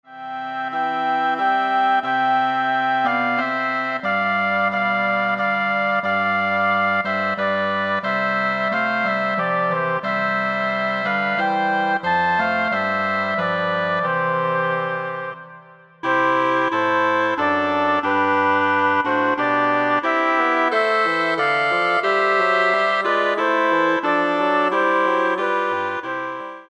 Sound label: Woodwind Ensemble